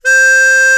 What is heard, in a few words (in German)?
MUNDHARMO; Alpine Volksmusik